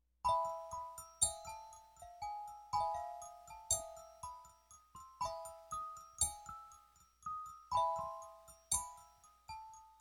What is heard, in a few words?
E minor in 5 bells